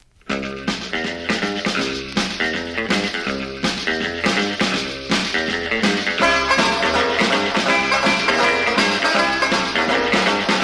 Banjo Rock

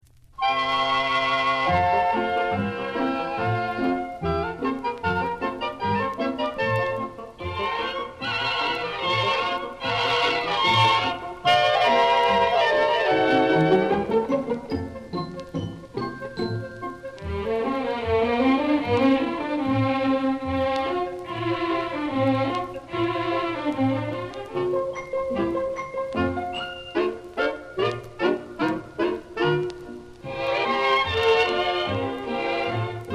Gavotte

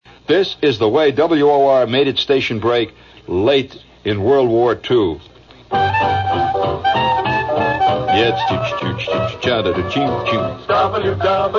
Radio jingle; 1943; WOR Mutual

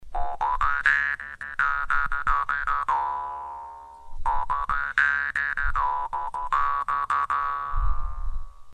Harp

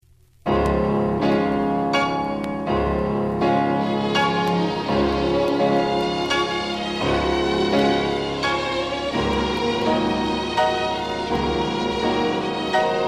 Piano Concerto

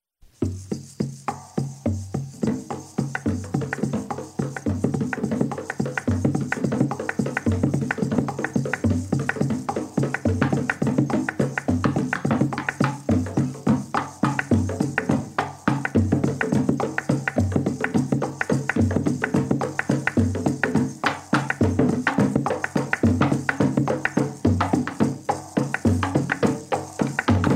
drum suite

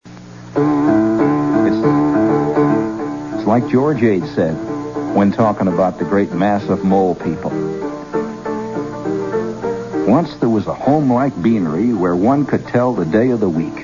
Piano Concerto

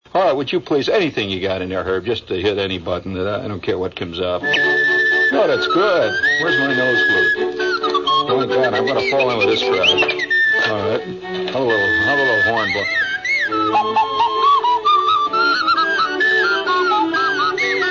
nose flute

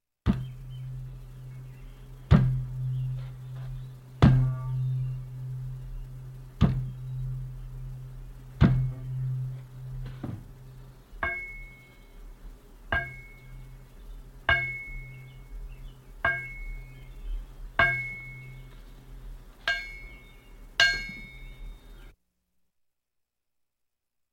Natural Pipes

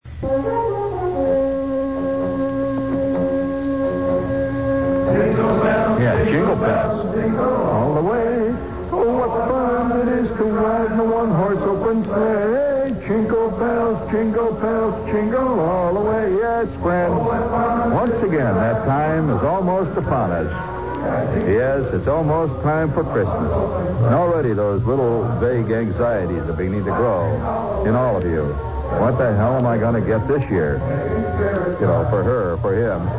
jingle bells

